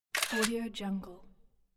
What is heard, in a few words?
camera shutter click